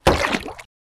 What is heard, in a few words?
Large stone impact splash water